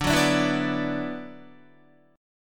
D 6th Add 9th, Guitar; strummed; 4E,4A,5D,5G,5C,6F; chord

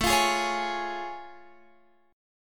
A Augmented Major 7th A Guitar Open F; strummed

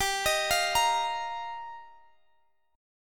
Eb Suspended 2nd, G; arpeggiated; Ebsus2/G

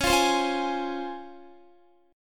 C sharp Major 7th C sharp, Guitar; strummed

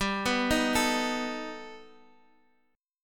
G Augmented Add 9th, chord

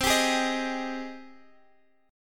G sharp Major 7th C

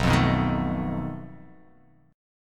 B 7th Flat 5th B, chord

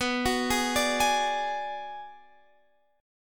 B Minor 6th B, Guitar Open F; Bm6 arpeggiated

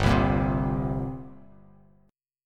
G Minor Double Flat 5th, G, Bass Standard; strummed; JGuitar

